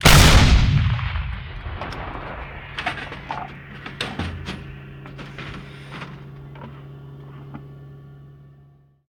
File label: Artillery Cannon Artillery; Sound Ideas; Guns